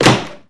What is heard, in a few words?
Crowbar Door Open; Lara; Playable Tutorial Level